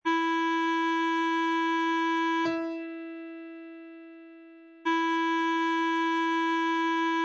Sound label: e minor e